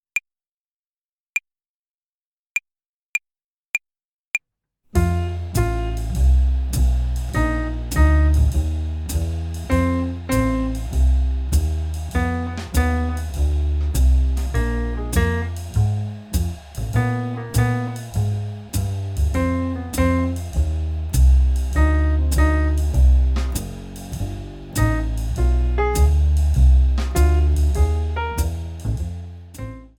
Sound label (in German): Blues-Tonleiter Übung, Play-along Slow